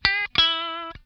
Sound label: LICK SCALE, Funk Guitar; Zero G - Funk Guitar